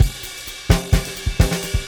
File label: Fusion 128, Beat Loops; Zero-G; Monster Beats